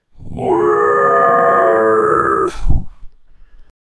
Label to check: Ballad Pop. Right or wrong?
Wrong